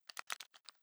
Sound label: sawnoff shotgun flip over; Rust